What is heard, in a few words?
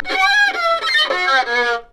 emote taunt; LoL; twitch